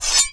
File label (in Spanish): Desenfunda Espada; Grounch